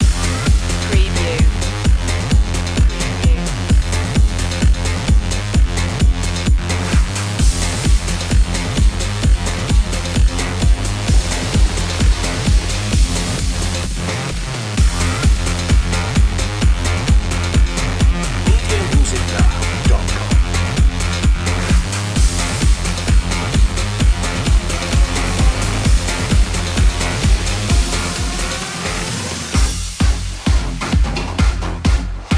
mutant motion, Uplifting electro synth and club groove. 130 BPM.